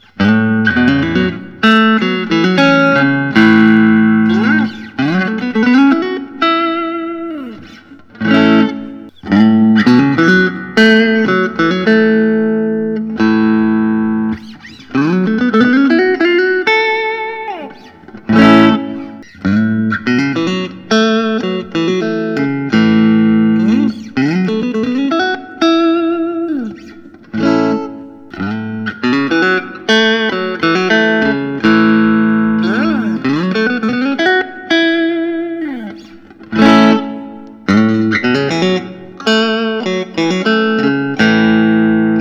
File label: james tyler black classic landau classic black